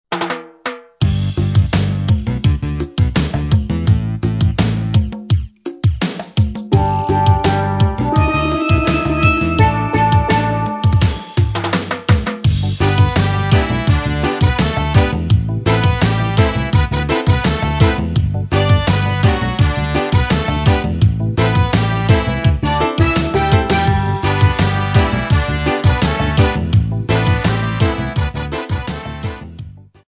steel and voices

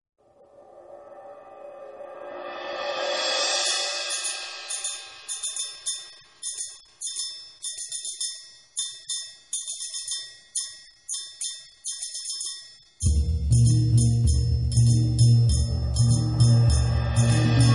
steel pan music

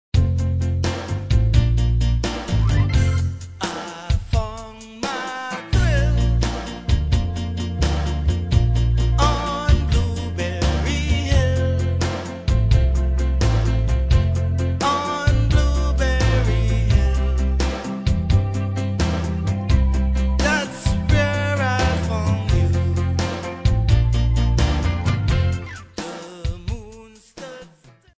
pan meets guitar

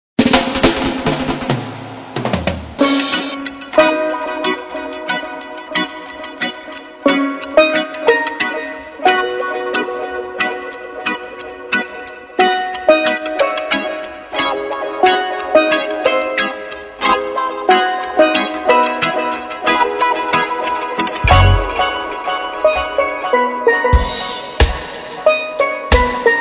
steel dub

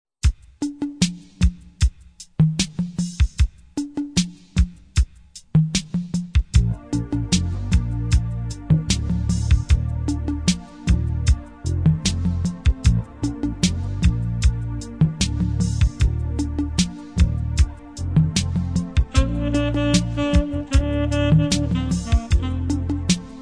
steel pan sax

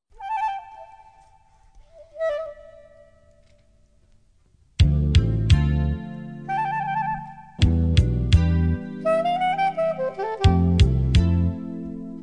steel pan sax

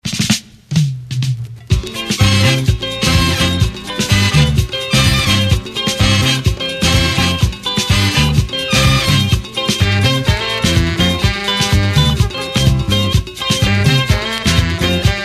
Classic Party Grooves

